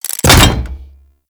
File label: Pet Grab; Warframe; Alad V Pet; Foley